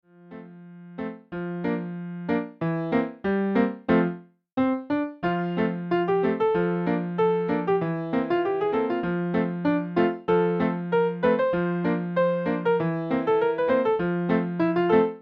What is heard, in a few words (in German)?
Ich bin ein Musikante; arranged for solo piano